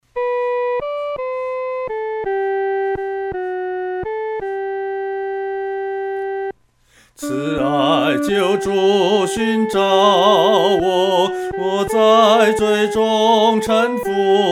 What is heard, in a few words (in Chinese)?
独唱 第一声